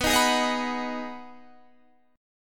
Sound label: B 6th B down Guitar Drop D